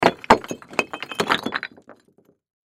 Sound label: Crash Brick Debris On Pavement Rock; Sound Effects:Materials; Blastwave